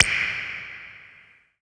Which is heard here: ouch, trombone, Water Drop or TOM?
Water Drop